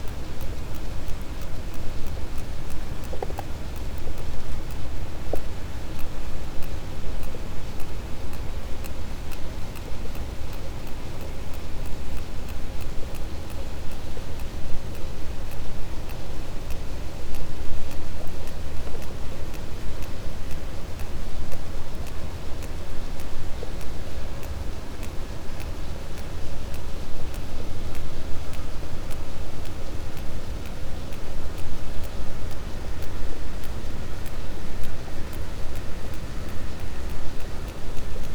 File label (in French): cachalot positif, Physeter Macrocephalus